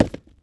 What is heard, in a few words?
Rhinoce Lord walk; Seer; GamigoNA